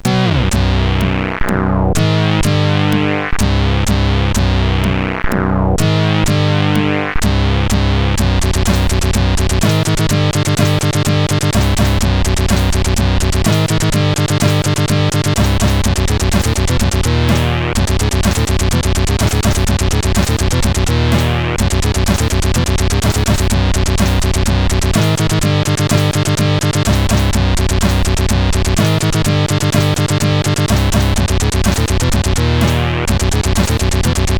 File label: Death Metal, CSG8580R5